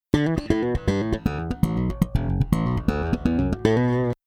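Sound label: Midi Audio Loop Bass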